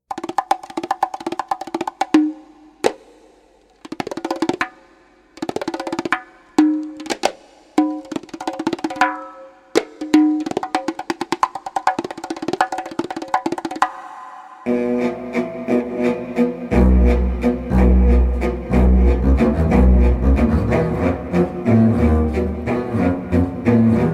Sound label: waltz